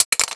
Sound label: mag pistol